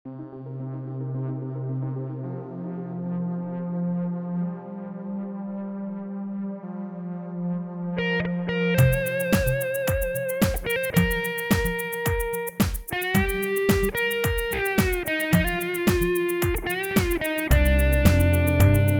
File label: tune